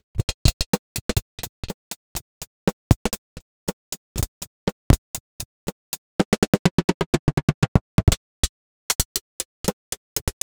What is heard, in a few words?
Hat Click; Extractor